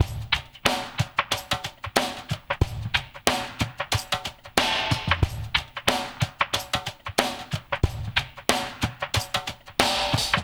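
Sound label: lofi mix left; Spectrasonics; Liquid Grooves 1 & 2; CAVERN SW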